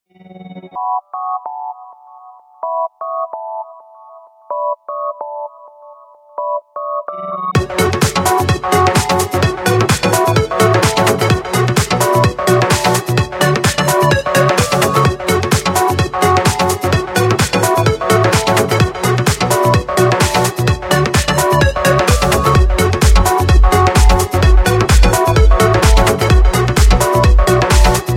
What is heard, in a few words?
message tone